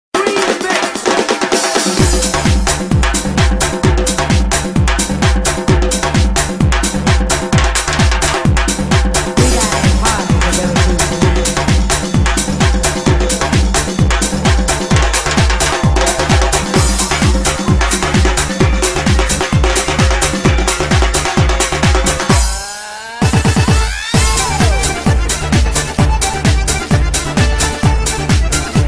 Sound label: disco lezginka